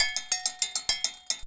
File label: bulu grooves; Best Service; World Colours